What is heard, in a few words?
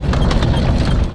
Cannon Rotate Wood; Weapons